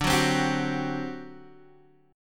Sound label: D 11th D, down, Guitar, DADGAD